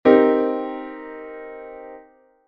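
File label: C minor 7 chord; ジャズピアノ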